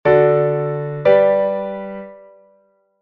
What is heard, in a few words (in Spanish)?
cadencia autentica ejemplo